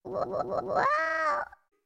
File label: Female Robot Laughs, servos